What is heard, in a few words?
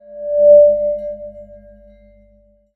distort feedback, Distortion; Miscellaneous